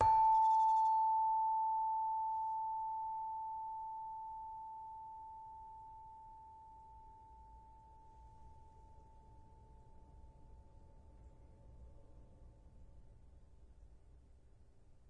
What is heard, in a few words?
Saron, Indonesian Saron; WORLD